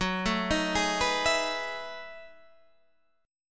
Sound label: B 13th Gb, chord